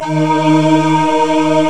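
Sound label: Fairlight G3; Northstar - New Gold VOL-1; M1 FAIRLITE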